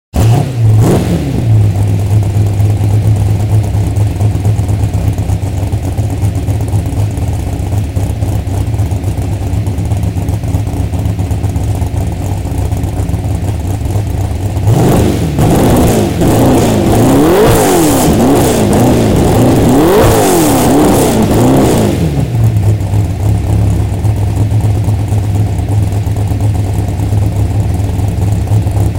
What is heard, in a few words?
Ford 34 supergas